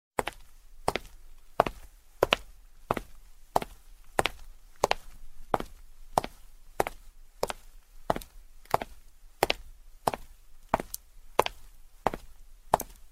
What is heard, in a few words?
Footsteps